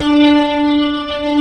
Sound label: D, The Piano; InVision Interactive